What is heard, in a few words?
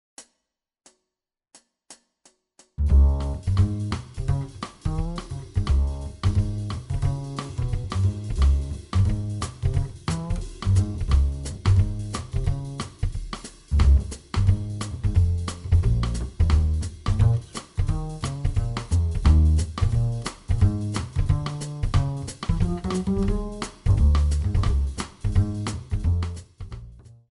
the girl minus piano